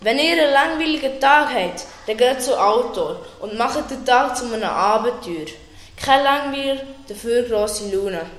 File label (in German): Jingle Outdoorsports; Unterseen